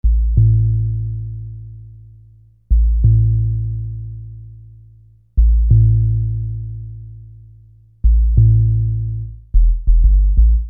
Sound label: Low Sub; Construction Kit; HIP-HOP; Sonic Foundry (Sony Creative Software)